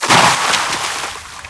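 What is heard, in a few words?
water in, male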